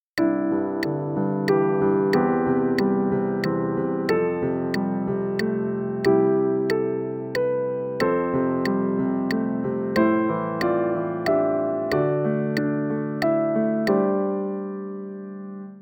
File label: constant 8ths